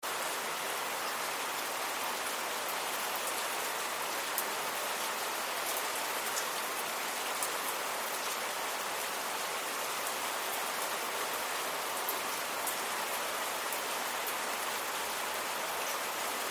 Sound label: window rain